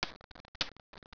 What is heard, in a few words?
mining; Caesar 2; DOS